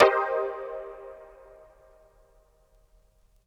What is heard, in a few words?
DX200 House Mid Vinyl Synths Cmin9 C3; vinyl chords